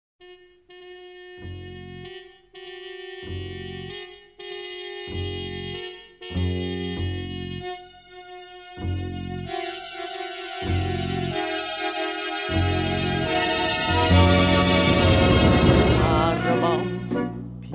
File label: Bells